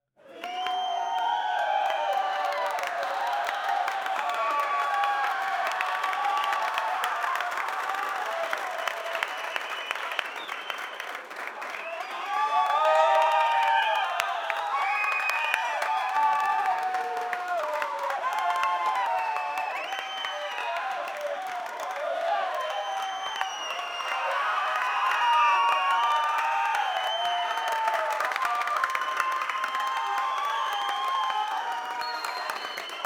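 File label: sitcom medium studio crowd, audience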